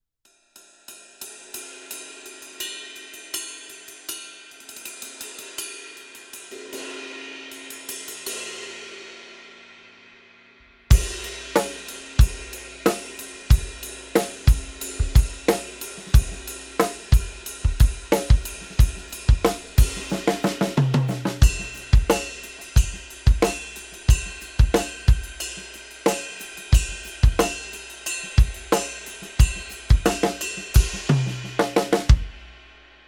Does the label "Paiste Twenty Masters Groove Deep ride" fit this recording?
Yes